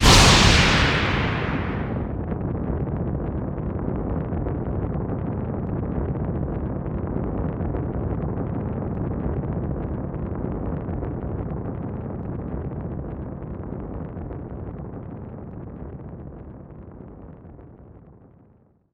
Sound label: sakura shine